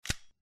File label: Unlock, phone ui